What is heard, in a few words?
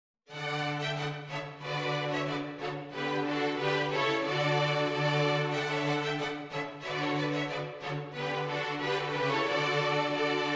Jig String quartet; Kemps Jig